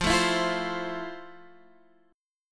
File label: F Major 7th F, Guitar, Standard; strummed; JGuitar